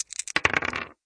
Dice roll; koi princess

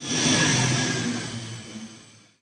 Car Pass By; knight rider

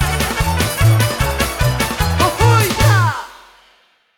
big Win End; colossal pinatas

